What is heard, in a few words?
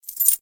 click